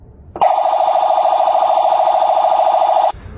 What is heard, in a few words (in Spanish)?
sonido ambulancia